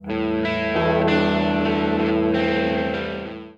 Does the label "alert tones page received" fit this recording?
No